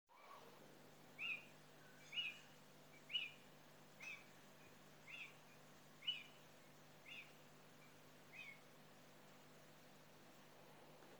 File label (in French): cris animaux nocturne